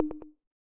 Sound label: button click; puzzle blocks